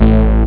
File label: bass, instruments; sonix; Rise Of The Dragon; chipmusic